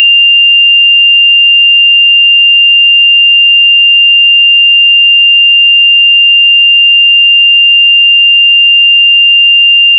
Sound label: ecg beep very soft; medical equipment